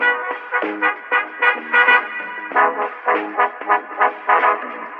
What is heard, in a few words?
horns stacattoid, loops, phonky